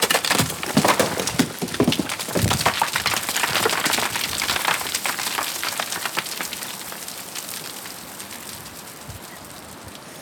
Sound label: Rockfall, On The Glacier